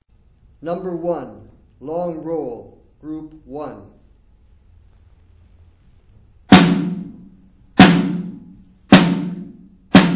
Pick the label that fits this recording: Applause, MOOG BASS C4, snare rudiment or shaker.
snare rudiment